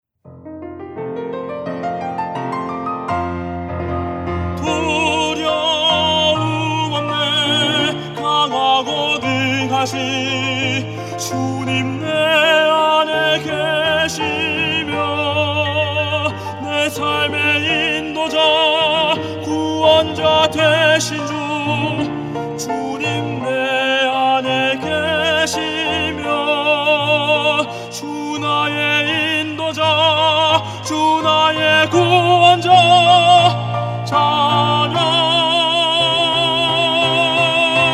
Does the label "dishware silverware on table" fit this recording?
No